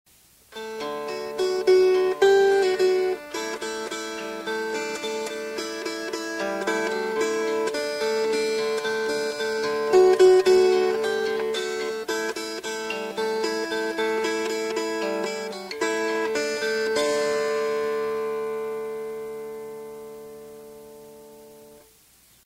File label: Fingerpicked; Hush, Little Baby